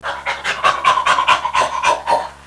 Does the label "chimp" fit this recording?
Yes